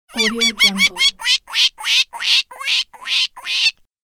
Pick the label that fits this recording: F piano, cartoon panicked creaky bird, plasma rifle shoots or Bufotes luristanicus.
cartoon panicked creaky bird